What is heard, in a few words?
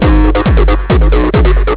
music